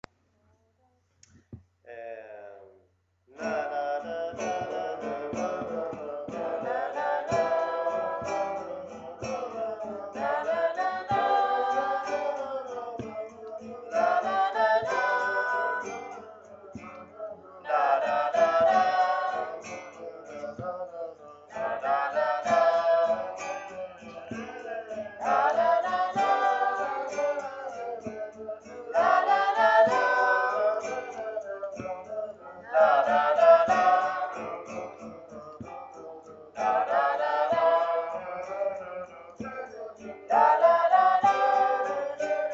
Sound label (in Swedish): Folkdanslat D-dur Alt; Ronneby Folkteater